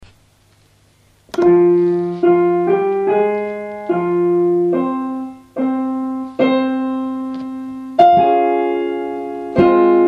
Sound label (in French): entre le boeuf basse